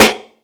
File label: original snare one shot a key; snare-drum-samples